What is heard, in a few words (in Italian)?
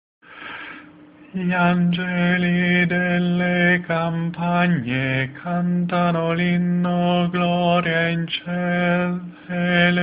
contralti; gli angeli delle campagne